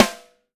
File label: 6X12 ALUMNUM, Living Drums